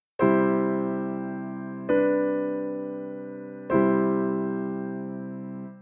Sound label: Tonic pedal V I